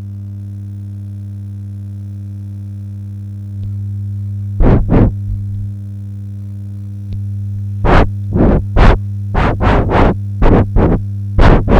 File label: Famicom Buzz; Famicom Borеs Efter